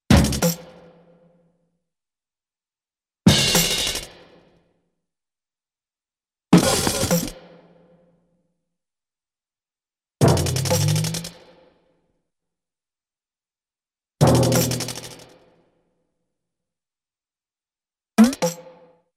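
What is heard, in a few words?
Reverberant Drum Hits with ratchet Sound; Cartoon; Hollywood Edge; Cartoon Trax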